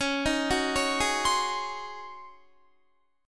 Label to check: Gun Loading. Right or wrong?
Wrong